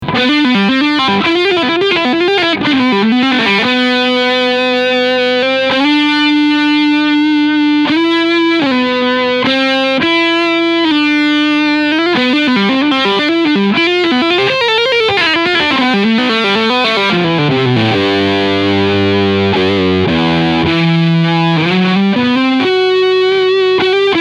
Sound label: Lead